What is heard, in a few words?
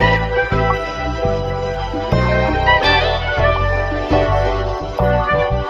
horns, phonky loops